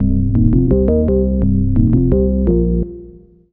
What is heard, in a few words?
Organ Riff; KJ Sawka; Drum n Bass & Beyond